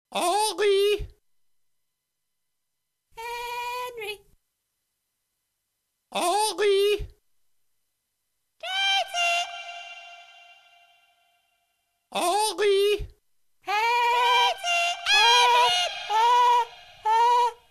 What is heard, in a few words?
chirping n cheeping, birdsongs